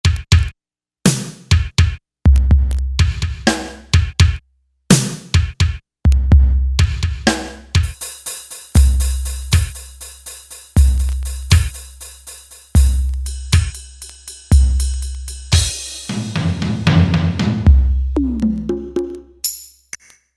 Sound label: Multi Kit